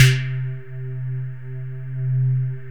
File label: BOUNCE; Masterbits; Vintage Voltage; SQ+FX